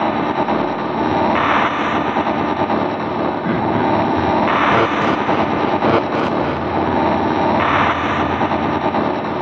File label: Radio Attack; Sonic Foundry (Sony Creative Software); Loop Noir